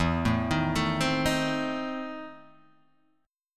E Major 7th Suspended 4th Sharp 5th, Guitar, DADGAD